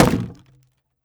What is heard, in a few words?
wood; Rust